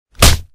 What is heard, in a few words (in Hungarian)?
effekt utes